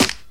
old school hip hop clap one shot f sharp key, Royality free clap tuned to the F# note.